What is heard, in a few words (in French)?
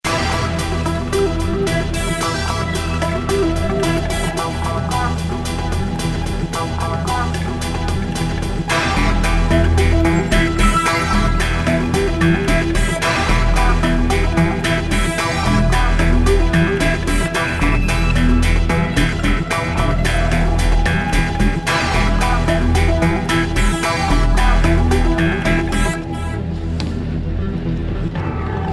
AMBIANCE ULTRAMARINE